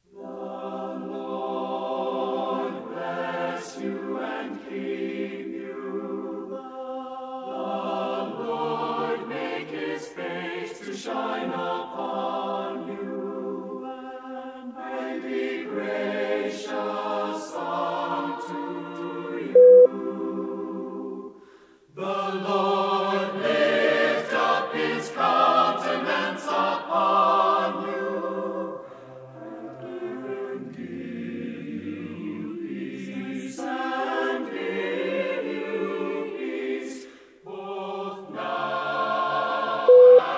benediction, a cappella

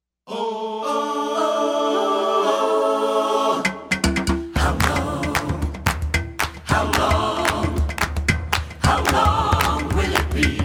a cappella

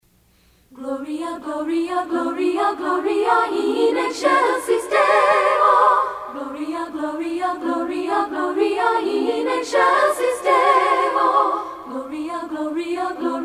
festal gloria, a cappella

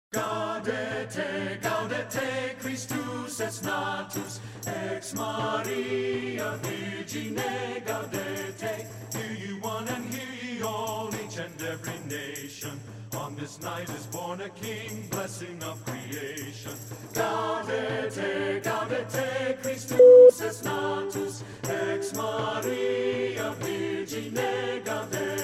a cappella; gaudete!

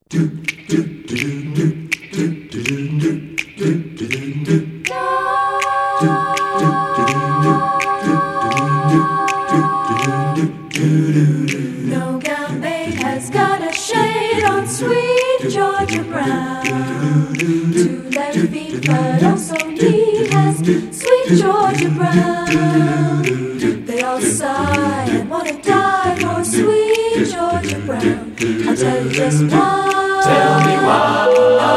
a cappella

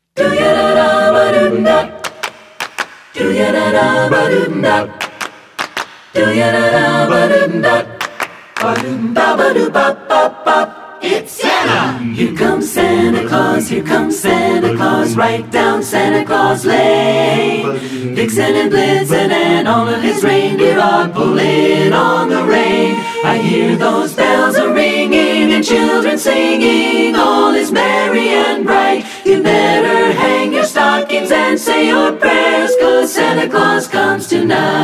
a cappella

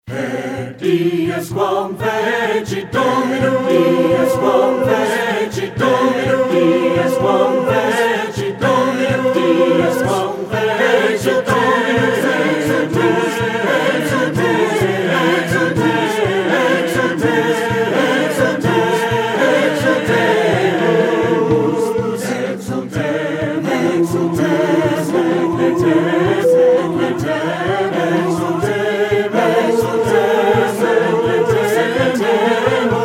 haec dies, a cappela

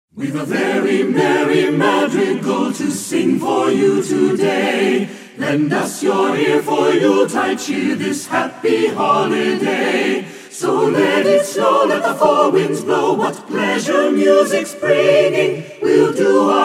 very merry madrigal, a cappella

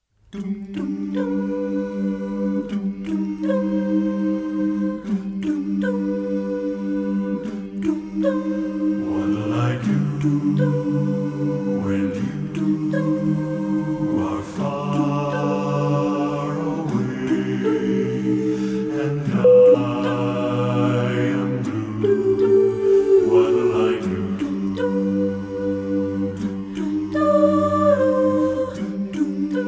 a cappella

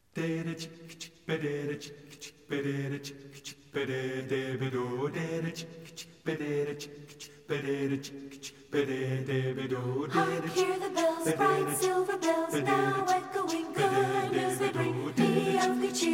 carol of the bells, a cappella

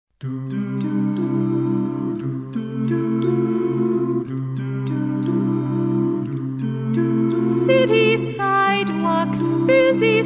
a cappella christmas

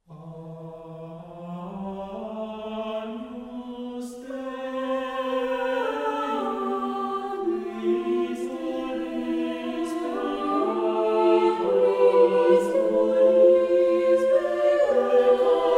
agnus dei, a cappella

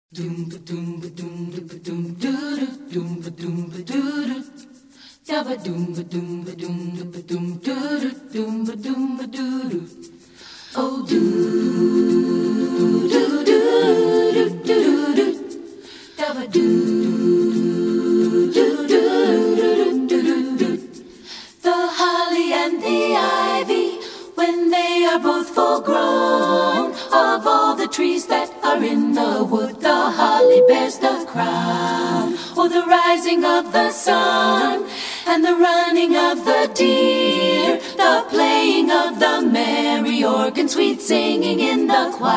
a cappella; holly and the ivy, the

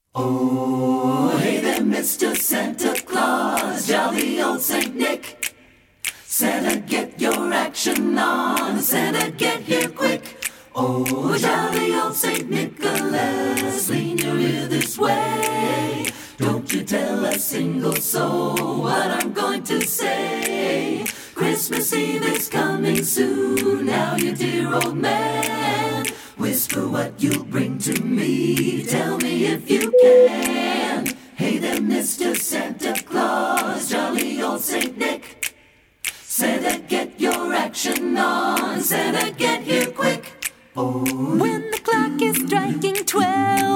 jolly old st. nicholas, a cappella

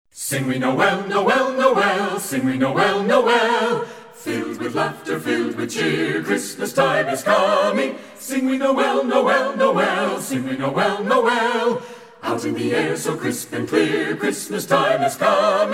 sing we noel, a cappella

